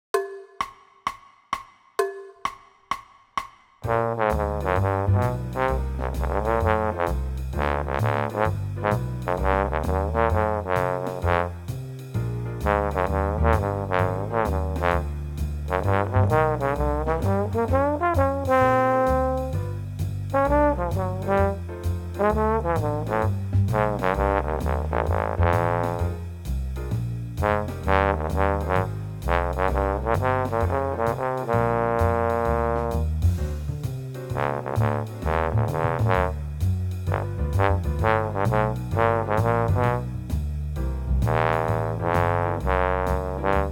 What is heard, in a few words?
Jazz Bass Trombone; Senior Jazz